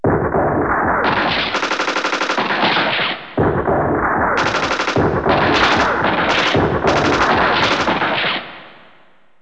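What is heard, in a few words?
gunshot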